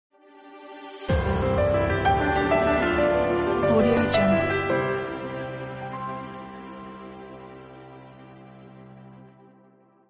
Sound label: elegant piano logo; archimusic